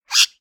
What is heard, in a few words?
parrot